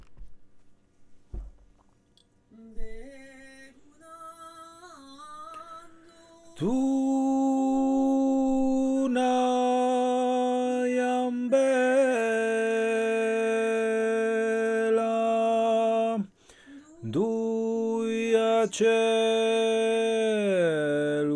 bassu; Ateliers chant; vecu

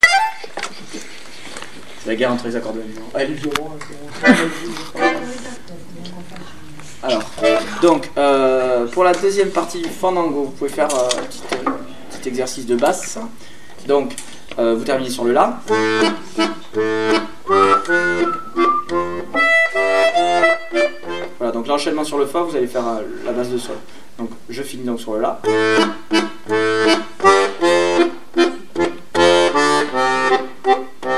exercices de basses; Fandango

